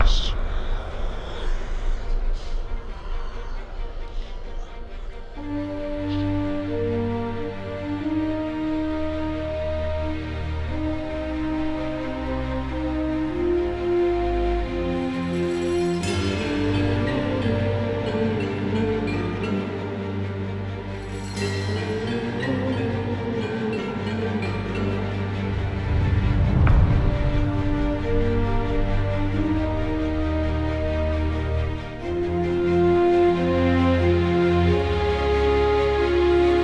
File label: music main game